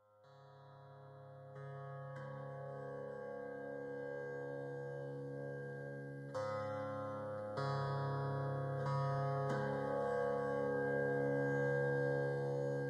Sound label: Sitar Shloka